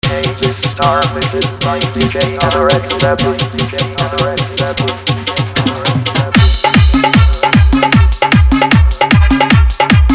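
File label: Wedding Dhollki Bass Dance Mix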